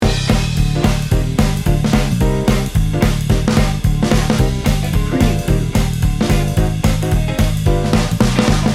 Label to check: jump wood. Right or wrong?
Wrong